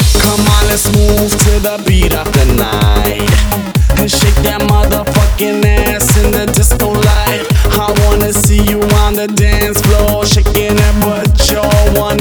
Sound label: 128 BPM Rap Let's Move Mix; Vocal-Hook Kits